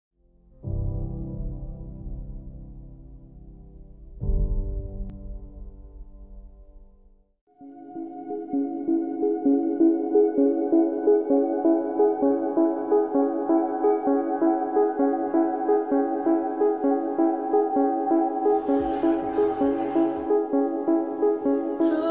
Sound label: bass boosted; Fast and Furious 8 2017